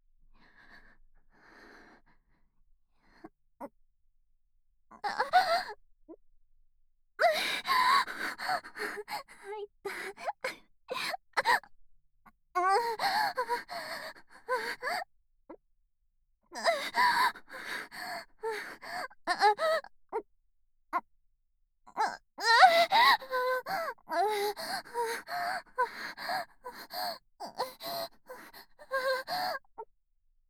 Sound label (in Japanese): 挿入 喘ぎ; SEなし